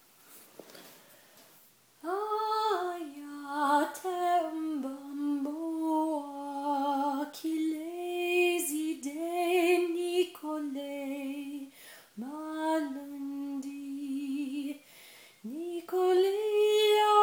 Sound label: Lullaby